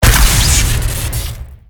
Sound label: flare gun crit; weapons; tf2